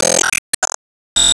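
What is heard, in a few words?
Battle 10sec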